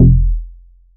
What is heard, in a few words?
bass classic dark F; Polyend; ARC Noise